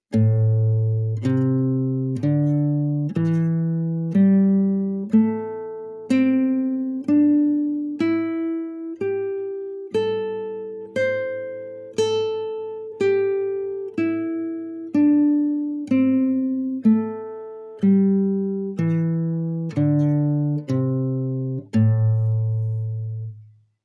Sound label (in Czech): Pentatonika